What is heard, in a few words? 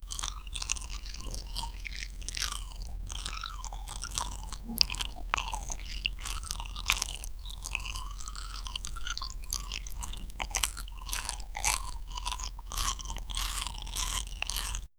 the sound of a record